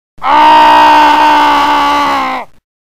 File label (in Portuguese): grito de dor